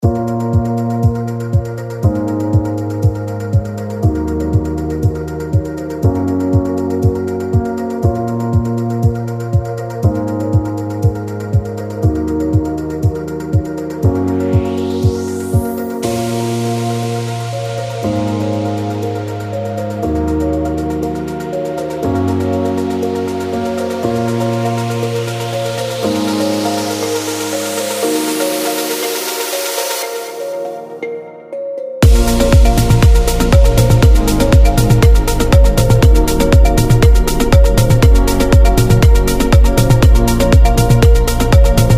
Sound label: Baila A min 120 BPM; ABT Sounds Sunrise Sample Pack